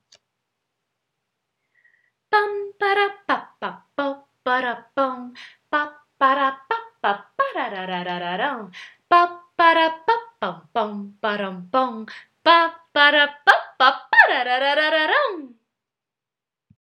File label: rain chuva